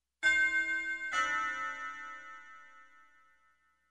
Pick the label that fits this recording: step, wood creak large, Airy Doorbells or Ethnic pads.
Airy Doorbells